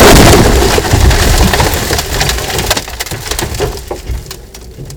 impact wall heavy; Kart

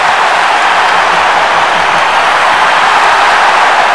scrub

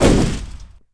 hay bale; AOS RF1 Kart Demo

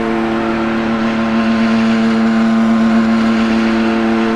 EX OFF VERY LOW, F1; AOS F1 2022; FSR2018

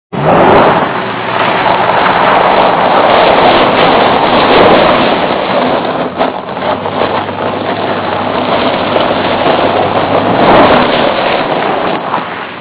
gravel dry; Kart

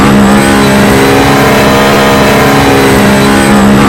in CMAX, F1; Mercedes; AOS F1 2022

